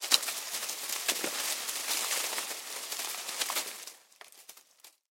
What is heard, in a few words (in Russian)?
audio effekt shelest kustov; prirodnyye zvuki zimy zimniye audioeffekty